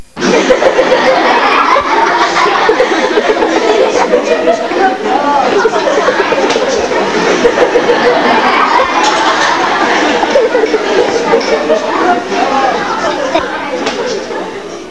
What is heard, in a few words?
Laughing